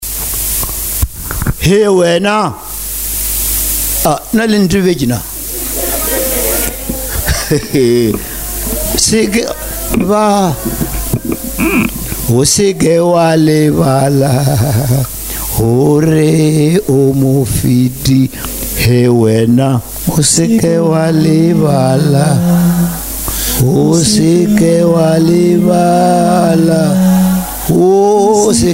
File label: Singing